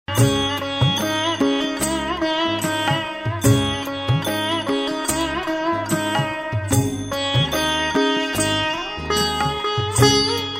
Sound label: Instrumental